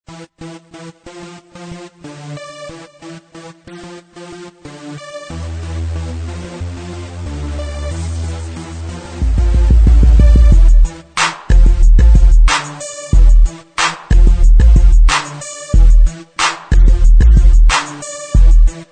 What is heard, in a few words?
instrumental sampler